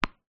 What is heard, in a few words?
sport tennis ball bounce hard court